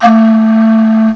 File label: SWANNEE, WIND